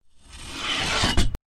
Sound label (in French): son guillotine